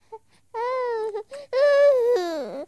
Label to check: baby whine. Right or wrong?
Right